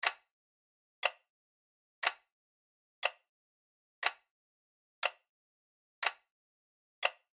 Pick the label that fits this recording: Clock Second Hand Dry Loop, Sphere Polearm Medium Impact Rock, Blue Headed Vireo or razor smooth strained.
Clock Second Hand Dry Loop